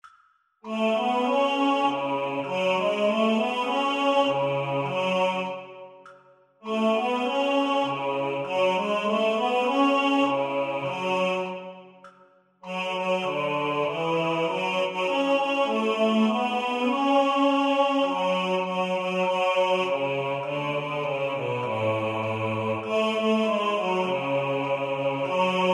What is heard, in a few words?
Tenor Basses Voice; Martin Prend Sa Serpe